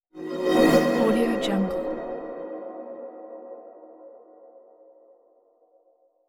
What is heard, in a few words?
air pipe pass by